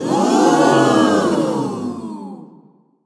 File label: ooooh; running man; genki